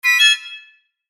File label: CHIME STABS LIGHT; Blastwave